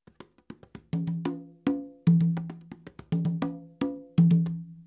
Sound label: guanganco, congas